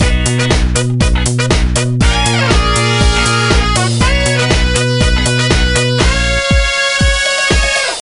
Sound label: Disco Flourish; gamerip; Happy Feet Two; nds